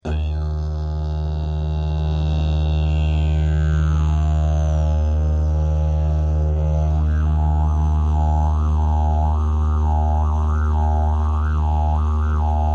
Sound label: didgeridoo